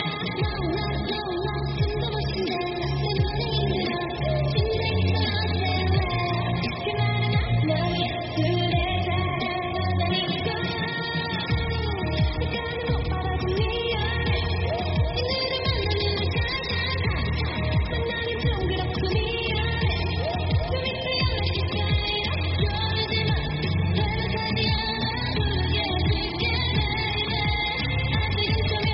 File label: bgm car